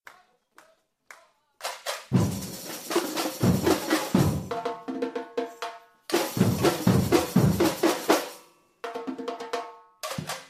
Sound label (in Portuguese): Break Ao Vivo; Bagunca